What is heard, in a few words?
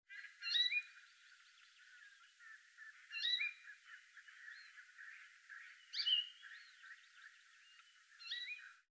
hawaii palila